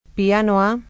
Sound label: PIANO A